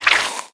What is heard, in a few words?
Warrior Acid Attack; we are the dwarves gamerip 2016